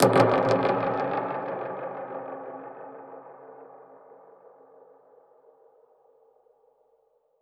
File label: Perc Hit, dub percussion; musicradar